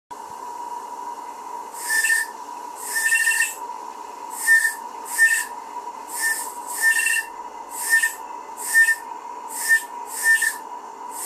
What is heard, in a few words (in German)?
Echtdampf kleine Pfeife; modellbau pfeifen; Herrmann